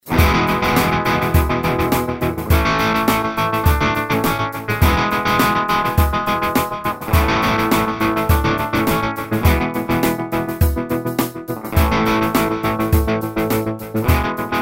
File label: Tremolo Sequence